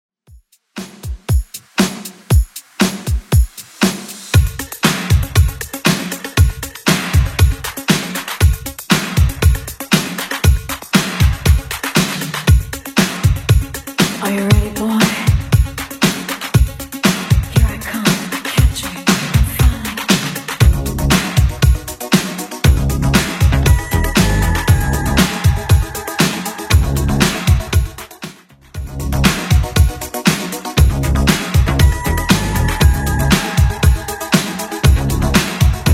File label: 80s Redrum 118 bpm